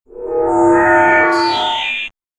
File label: short sound from droid; movies; r2